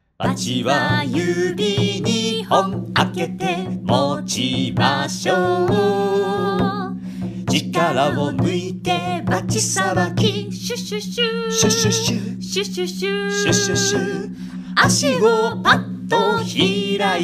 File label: song taiko kihon vo taiko; taikonouta kihon